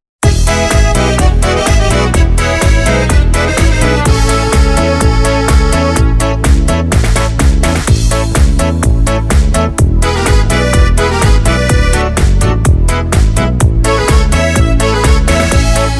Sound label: Korg Pa3X